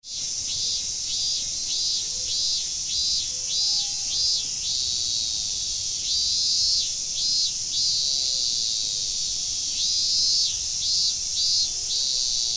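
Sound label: cicadas, Fukushima University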